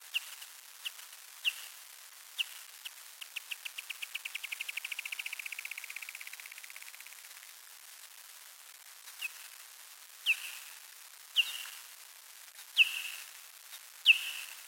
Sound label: feedinbuzz